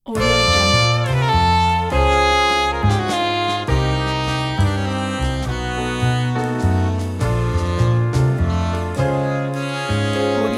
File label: beautiful relaxing jazz